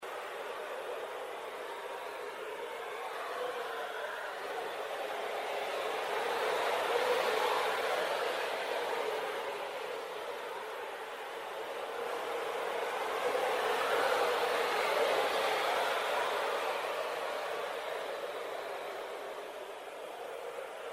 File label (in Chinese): 清风徐来